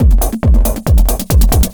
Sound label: 138, Techno, drum loops